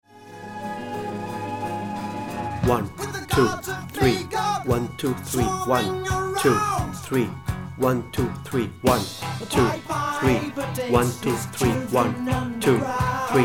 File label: solo de organo WITH voiceover; Apocalipse